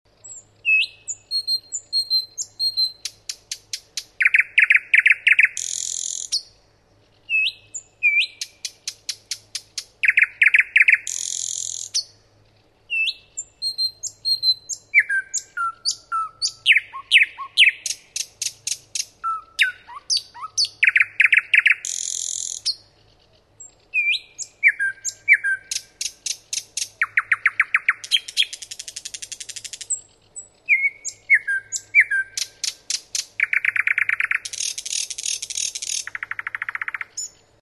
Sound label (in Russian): obyknovennyy solovey golos